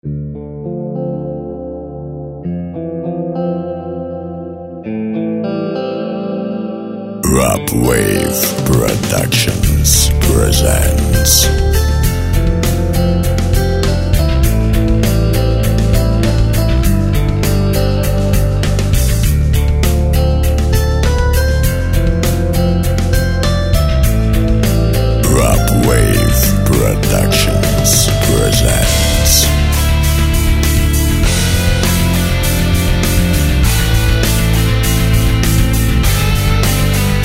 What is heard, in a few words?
Emotional rock